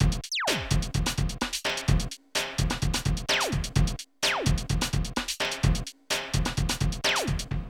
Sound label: Drum loops, Rave & Hardcore; Zero-G Groove Construction (1993)